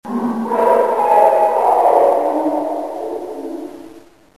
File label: Castle Ambience; dragon lore